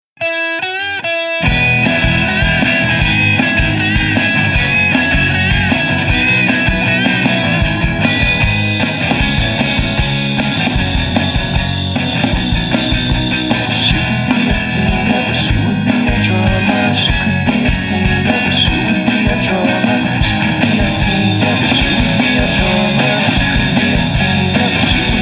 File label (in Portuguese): vinheta